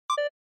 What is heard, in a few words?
Short Accent Dry